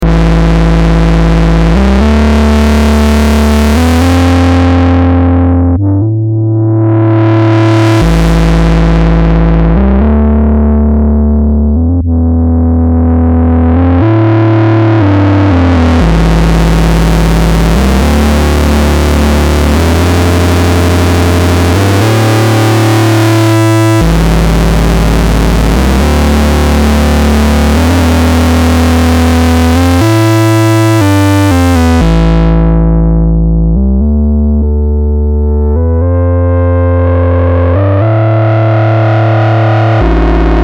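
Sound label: OSC 1 2 Sync XMOD Osc3 Filter; Roland SE-02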